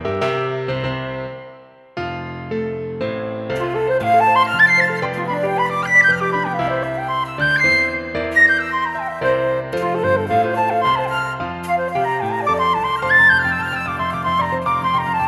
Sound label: Flute